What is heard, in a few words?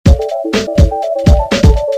Loop